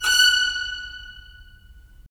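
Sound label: strings violins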